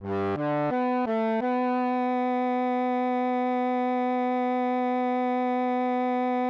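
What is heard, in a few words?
bowed bass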